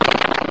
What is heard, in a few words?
break block; SuperMarioWar